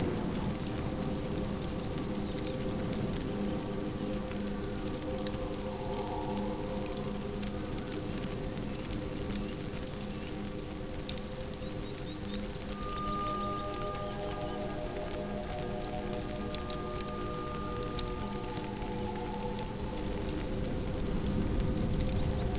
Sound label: song; prettiepaws